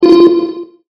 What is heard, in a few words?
countdown